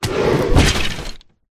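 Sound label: Dragon Skeleton die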